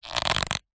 foley plastic squeeze stress creak against dense foam; zapsplat